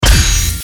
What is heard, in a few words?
shield; MK9; Shao